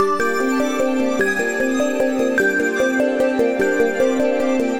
Weird Arp - Gmin 75 bpm; MIDI Clips; Tonal; Motifs; Ableton; Mood Reel

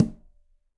Tumba B Mute, Conga, Latin Percussion; Drums; Drum Hits; Ableton; Factory Packs

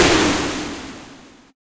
8bit Explosion; Instruments; Effects; Ableton; Factory Packs; Synth Essentials; Operator

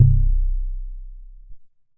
Acoustic Bass; Drive and Glow; Ableton; Factory Packs

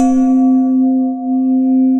Zen Bowl; Ableton; Mood Reel; Majestic Mallets

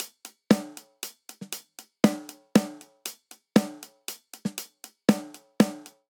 Straight Three Rock Snare & Hihat 3 4 118 bpm; MIDI Clips; Drum; Ableton; Factory Packs; Drum Booth

